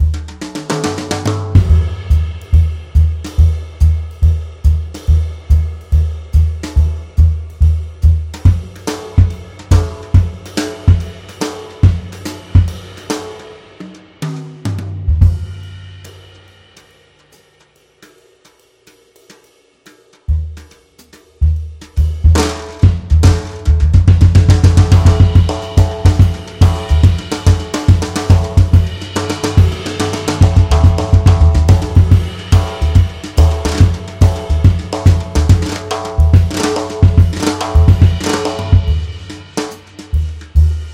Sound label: Jazz Drums Solo